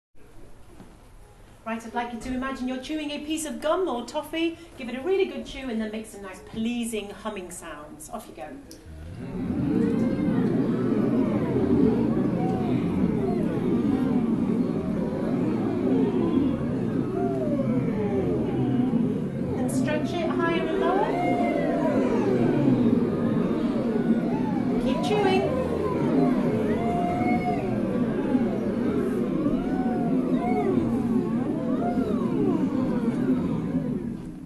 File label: Chewing Humming